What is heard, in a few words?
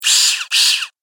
Robot Claw Appears; Robot Sound Effects; Sci-Fi Robot Sfx; Andrew Fleming; Twistedtracks